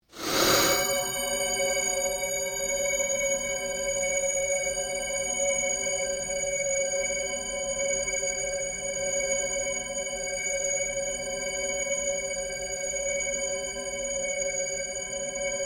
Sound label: surge fade slow, Various sweeps, pulses and drones; Rise And Dive; Rises and Dives